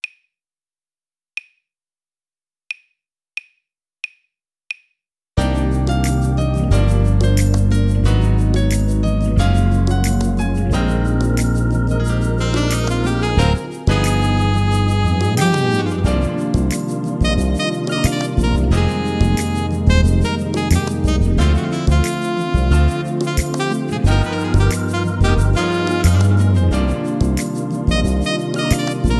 Smoove Jazz drumless

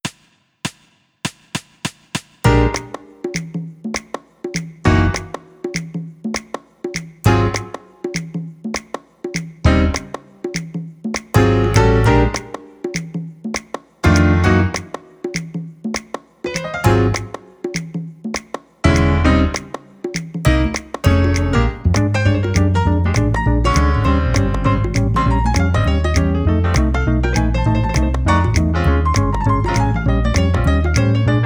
Classy Jazz drumless

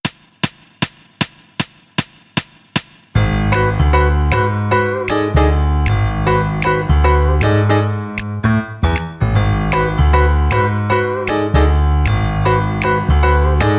Mystical Jazz drumless

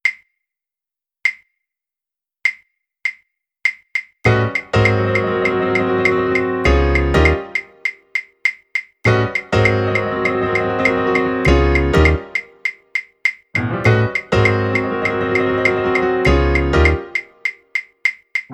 Jazz drumless

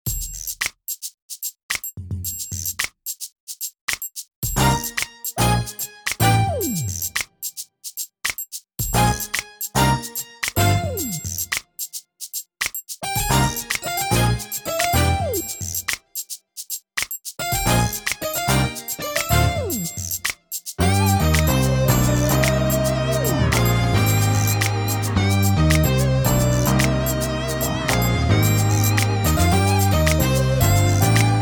Phat Jam drumless

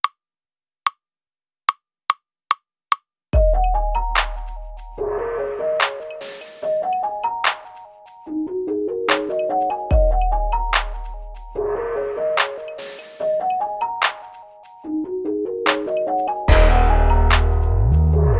Simple Trap drumless

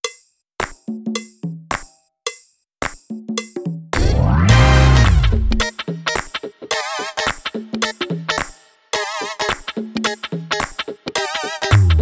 Funky Funk drumless

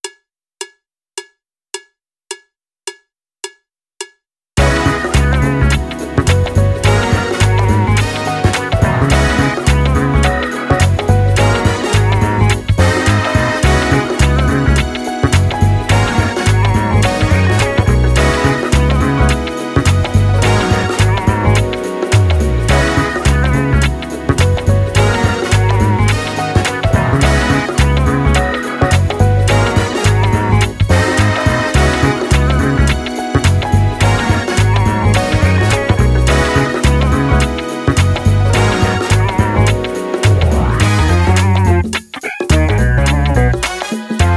Funk drumless

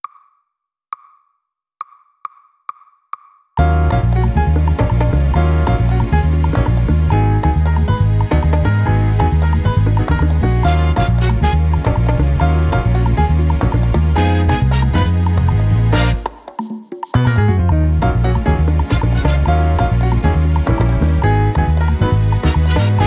drumless

